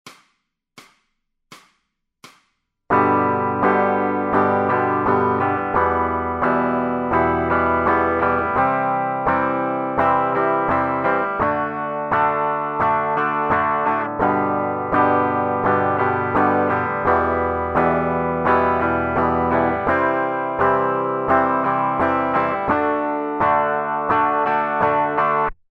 Strumming Patterns For Guitar

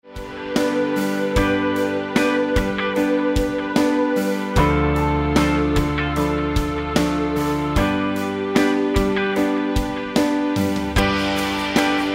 Bass Celtic Rock

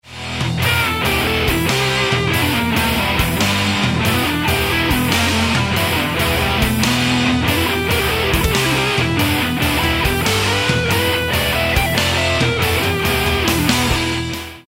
metal guitar scales blues

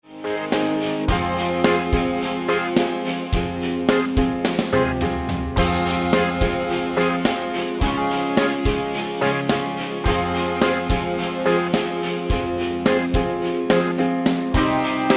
Bass Alt Rock Jam

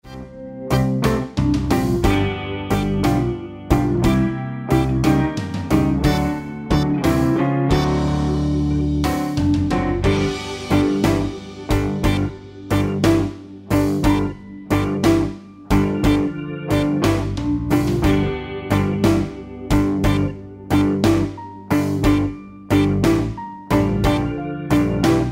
Slow Blues Jam 12 Bar Blues Backing Track in G

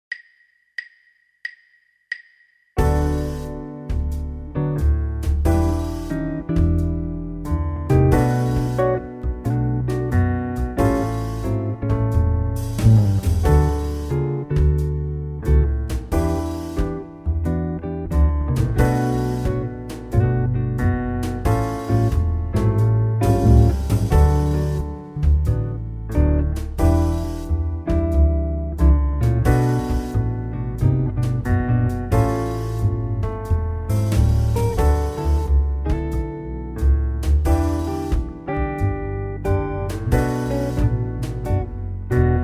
Improvisation with whole tone scales

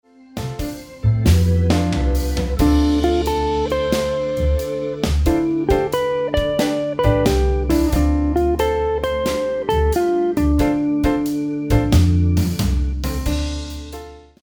dorian lick

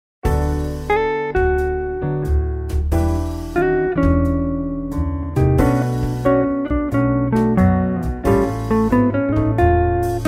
Improvisation with whole tone scales example

